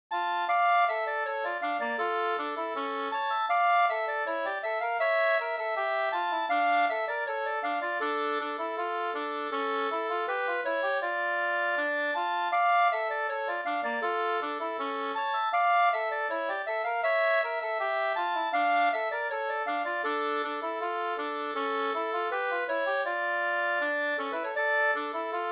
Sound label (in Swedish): brudmarsch; fr Sm; e Herbert Jernberg